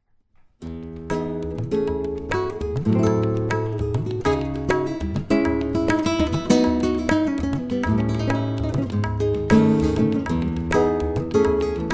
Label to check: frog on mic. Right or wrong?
Wrong